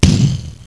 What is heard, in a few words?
Explosion Sound; Woden1.3